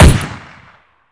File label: M4A1 Single; Kibblesbob